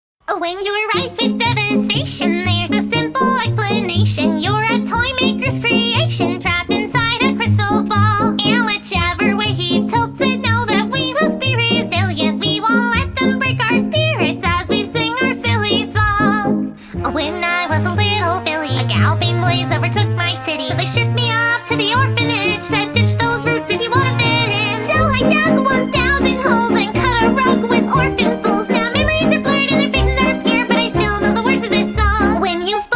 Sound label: Gypsy Strings, Orchestral Mix; Pony Music Archive 21.03